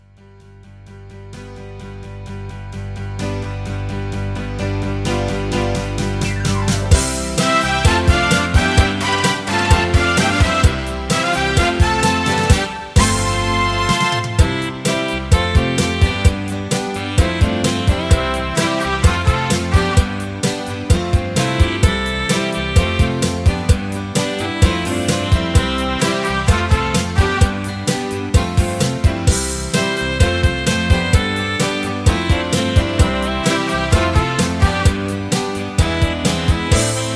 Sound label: Instrumental With BGVs